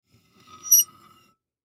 Plate Scrape Fork, Plate Scrape Fork Screech Scrape Dish; Household; Dishware; Blastwave